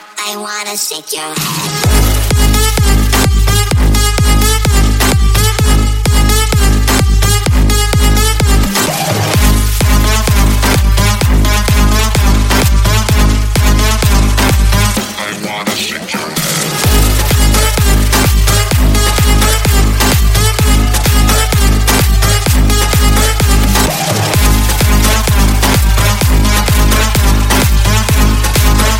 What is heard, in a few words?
EDM